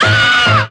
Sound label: "ah" SUNG, Voice; ooh ahh